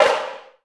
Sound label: SHIME-DAIK